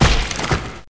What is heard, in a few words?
die egg